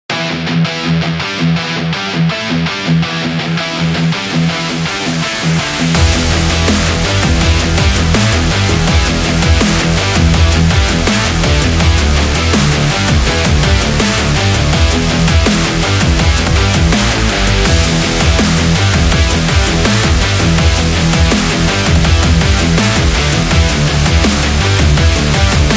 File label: Rocking Dubstep